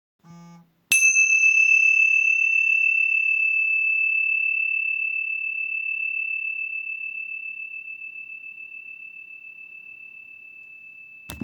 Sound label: tingsha aum mantra; Tingsha Symbol Aum OM Mantra